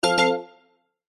multimedia game tone synth bright organ style complete finish